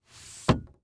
Transportation Chevy Trailblazer Open Sunroof Cover